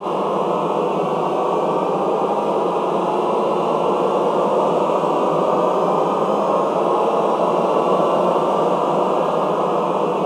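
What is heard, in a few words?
Glissando ENDL, Classical Choir; Best Service; Extended Classical Choir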